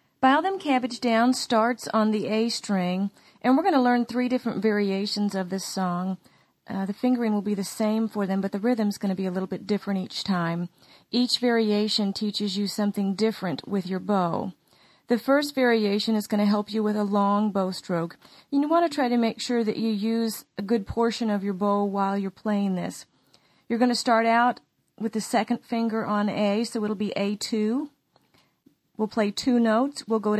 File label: fiddle